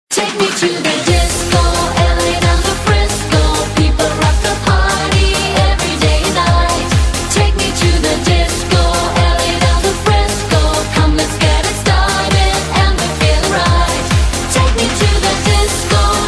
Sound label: Disco; DJ背景